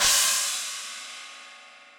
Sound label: China Deep Snare Dynamics, Cymbal; Drums; Drum Hits; Standard Hits; Ableton; Factory Packs; Session Drums Club